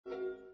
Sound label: slide up short; snow white